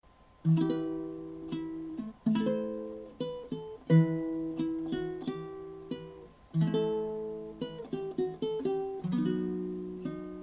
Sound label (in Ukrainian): Bandurka Uke; Zore moja vechirnjaja; Korala